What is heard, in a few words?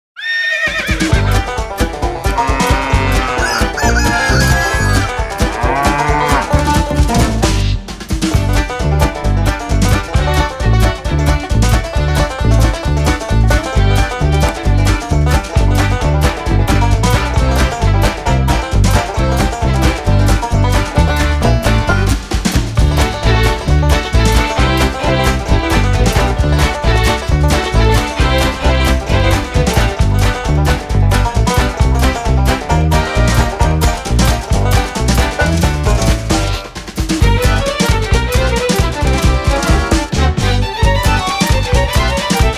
instrumental